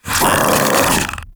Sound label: Infested Splitter Joined Vocal Attack Medium; Grey Strain